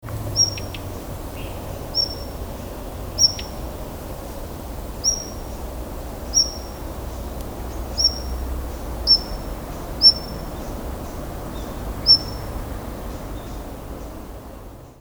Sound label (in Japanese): joubitaki